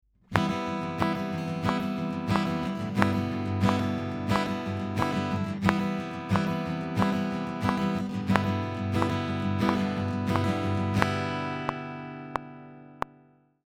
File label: 16th Notes